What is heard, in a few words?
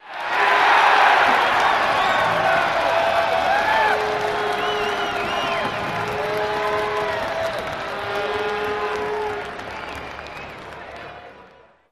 Crowd Cheer Horns Outdoor, Crowd Cheer, Touchdown, Outdoor Stadium, Large